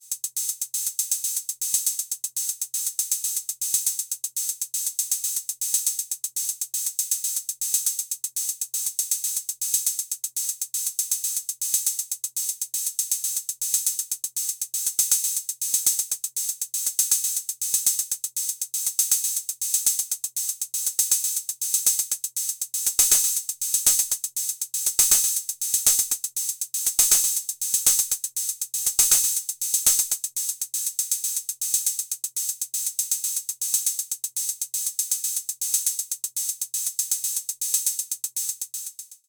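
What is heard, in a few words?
Roland TR-06 Boutique closed hi-hat open hi-hat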